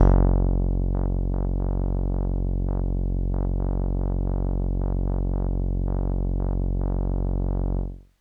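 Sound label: Kawaii F#3; Randroid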